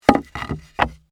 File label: Wood Adjust, Industry Wood Movement Adjust Construction Material; Factory Sound Effects; BlastWave